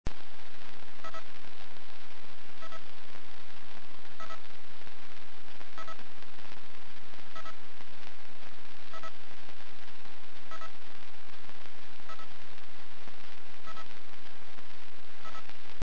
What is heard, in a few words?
onkyo switch sound